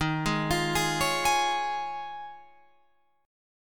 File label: Eb 9th Suspended 4th, Guitar, Open F; Eb9sus4; arpeggiated